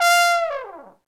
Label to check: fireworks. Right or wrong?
Wrong